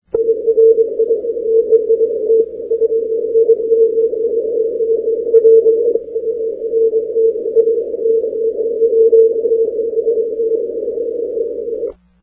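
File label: 80m long path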